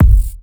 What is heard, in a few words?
kick crunchy highs; Polyend; Recue